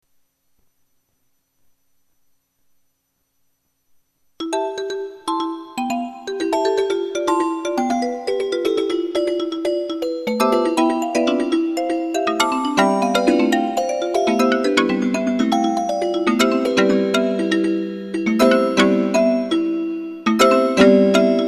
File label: Honky